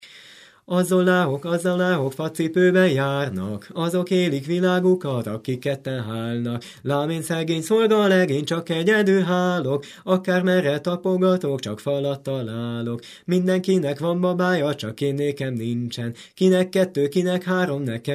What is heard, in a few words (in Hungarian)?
nepdal az olahok facipoben